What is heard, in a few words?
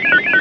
Rhodes FX; Mo' Phatt; Digital Sound Factory Mo' Phatt